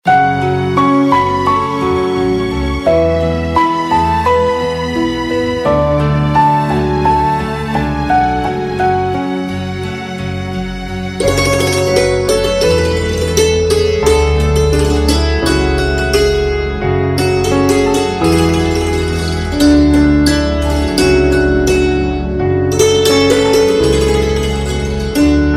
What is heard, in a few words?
Santoor Mix Instrumental Ringtone; The Xpose; Bollywood Hits (2014)